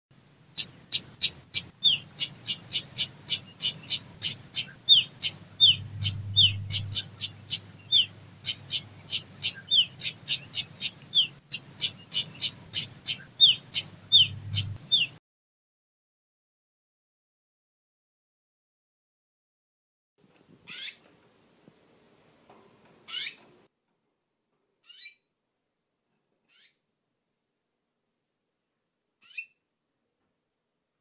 kombinasi decu kenari gelatik betina

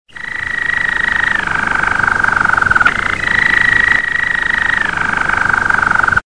chorr atau knorr waterslager malinois

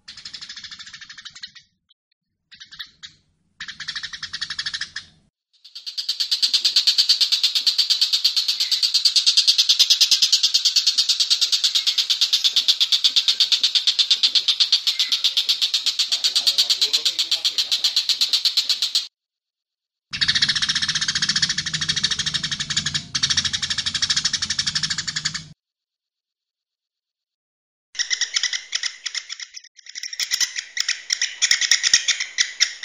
kombinasi masteran cj

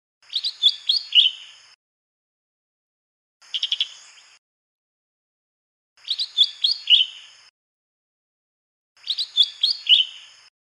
suara kicauan cucak hijau mini di alam liar

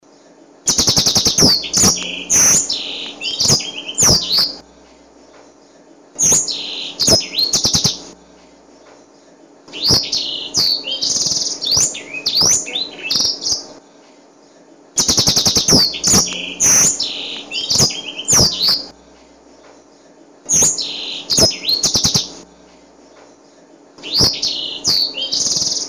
edel sanger ngerol nembak

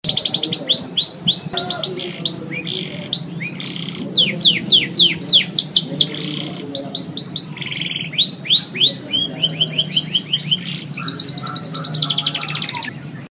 variasi lagu kenari snow white

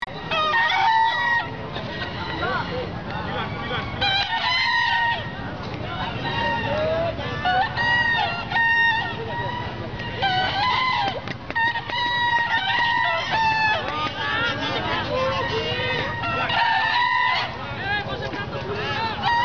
suasana bekisar kelas pratama

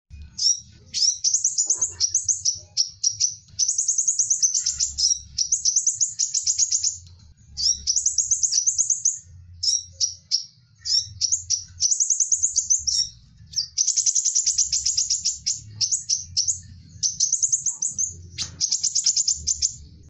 suara burung madu sepah raja

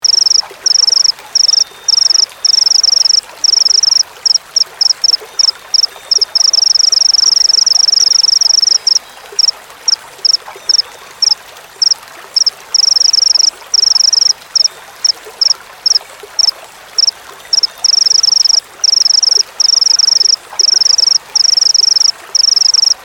jangkrik

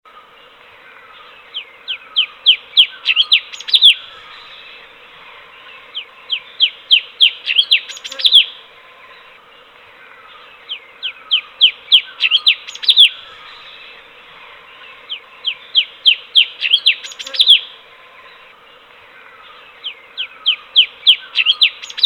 suara kicauan cucak cungkok

